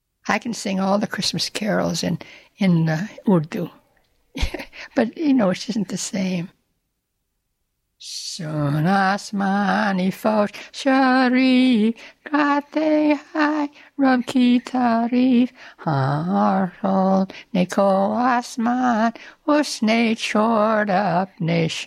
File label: hymn